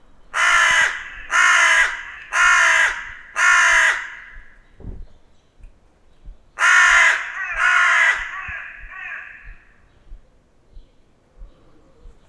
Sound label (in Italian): Cornacchia grigia Corvus cornix; Giovanni Worona